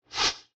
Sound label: Motion Design Woosh; SoundsCrate